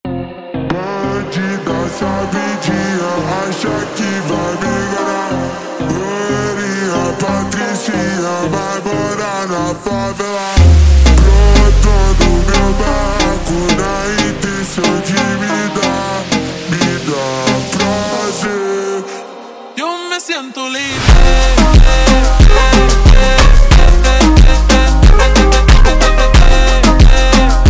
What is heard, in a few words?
super Slowed; funk